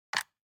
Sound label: DICE on Wooden Tray, Grab, Standard, 5 Five Dice; Samples; ArticulatedSounds; DICE Sfx Party (Extended)